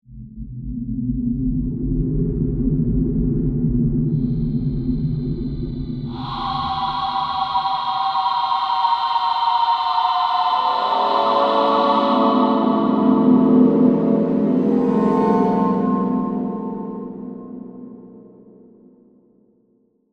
Horror Ghostly, Swell, Metallic; Ghost Sound Effects; BlastWave

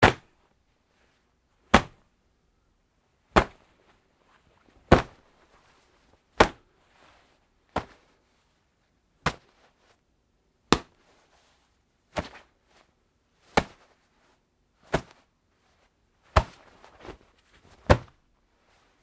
Foley Fight Moves Pillow Case Grabs Series; Wrestling And Struggling; Big Room Sound

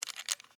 Skeleton Key In, Insert, Into, Door, Lock; Foley; Jangling Sound Effects

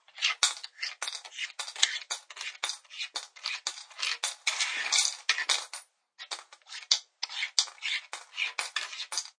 Dragging coins across a flat surface; Counting Coins; Clinks

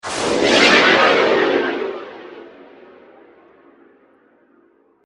Whoosh Filter; Whoosh, Filter, 5.1

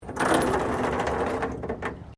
Chain rusty drag metal; SoundEffects Foundation2

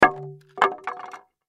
Impact Wood Drop Plank Fall; Wood (Sound Effects:Materials); Blastwave

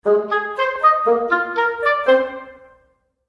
Sting Woodwind Ending; Stinger; Stinger Sound Effects; sound design

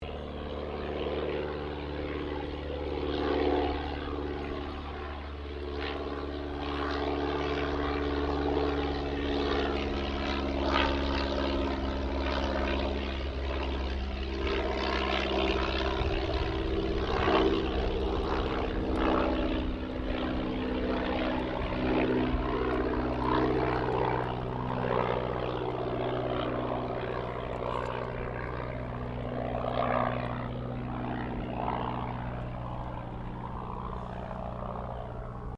AIRCRAFT DH82A DEHAVILAND TIGERMOTH PASS SLOW; 1936; Airplanes; Vehicles

